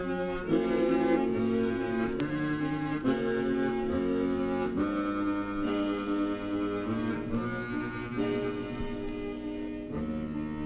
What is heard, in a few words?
silent tune